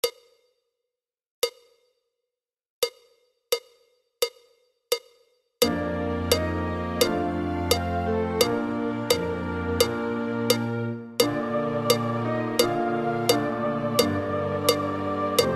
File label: Click Track 86; Alma Mater